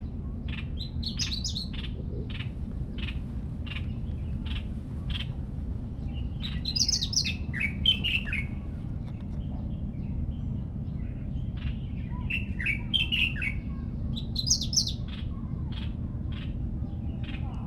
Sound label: warbler grey crowned Seicercus tephrocephalus